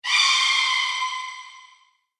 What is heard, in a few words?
Banshee Shriek, ghost; horror